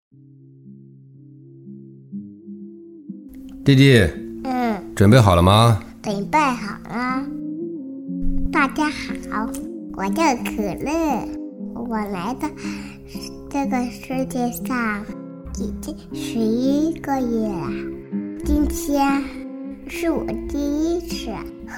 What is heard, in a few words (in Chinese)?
真男童 宝宝 小男孩 1岁左右; 中英双语; 素人自然